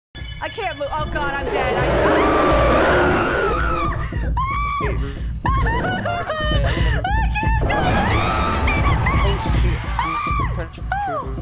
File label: scariest scream